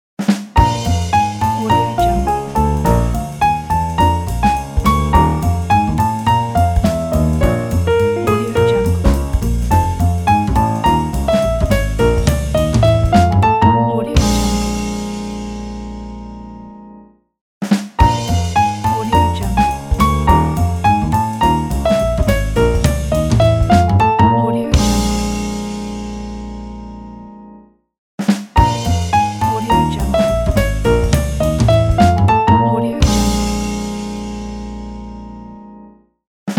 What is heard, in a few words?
jazz intro logo